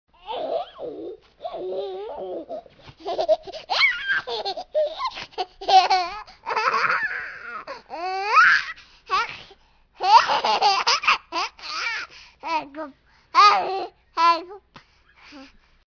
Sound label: Baby laughing; Babies Crying Laughing